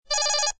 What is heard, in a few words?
console warning, computer